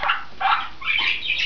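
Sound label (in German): rabenartiges kraechzen, wellensittich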